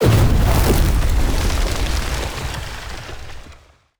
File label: Fire Elemental Fire Ball Explosion; TANK; 3Souls